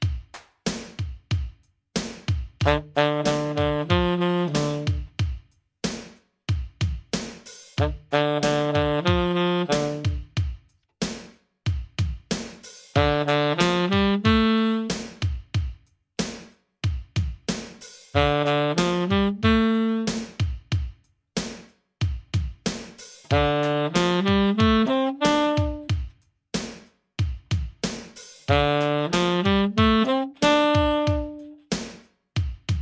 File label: Tenor Sax